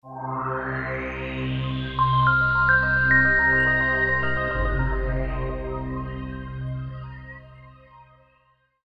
Software Logos Warm Pad Twinkling Ascending Notes C minor